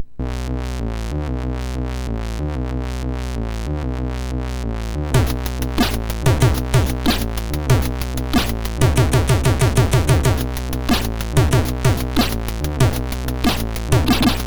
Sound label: First Shower tune,sid MOS6581R2